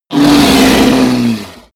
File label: dragon roar; kreaturen